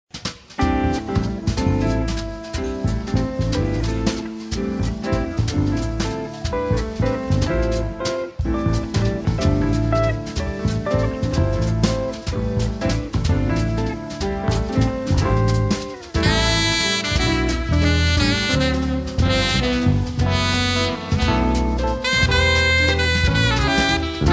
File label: Stately Waltz